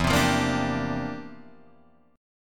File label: C 11th E